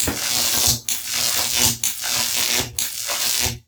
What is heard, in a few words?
electric shock; resonantinduction